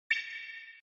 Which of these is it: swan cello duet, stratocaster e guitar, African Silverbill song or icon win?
icon win